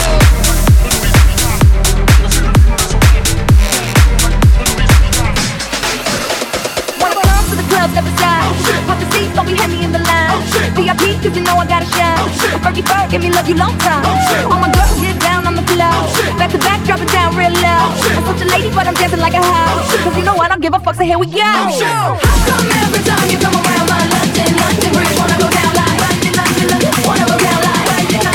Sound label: Festival Bootleg